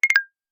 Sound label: Video Record Stop, ui; Alcatel 3 OT-5052D